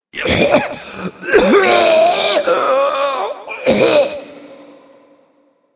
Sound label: Choking; Bolvar Fordragon